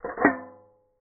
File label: Footstep